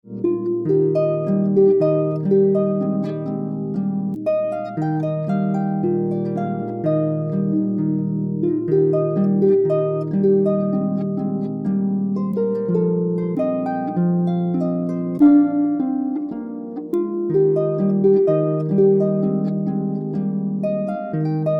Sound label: Harp; The Entertainer